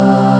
chor; ST-XX Amiga Soundtracker Sample Packs

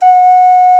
OKARINA FIS, Alpine Volksmusik; FLOETE; Best Service

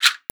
RASSEL; Best Service ProSamples vol.42

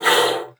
PUSTE, bassoon; Xsample VOL-6

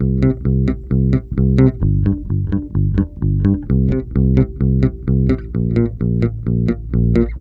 BASSE; USB Soundscan vol.32; Roots Disco; AKAI

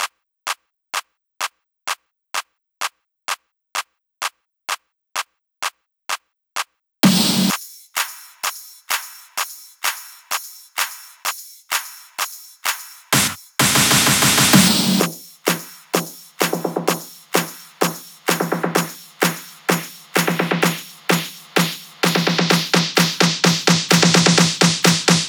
Drum Loop 128BPM Full ohne BD FX

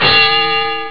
glocke; ST-XX Amiga Soundtracker Sample Packs

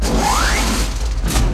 ROBOTE; Best Service; Advanced Mega Effects

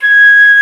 FLOTE, Alpine Volksmusik; Best Service

